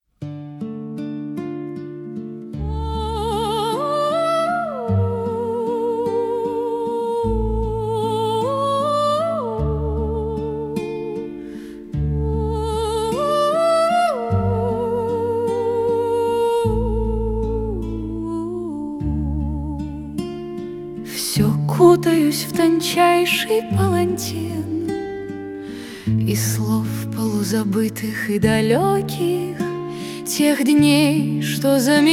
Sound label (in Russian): rumba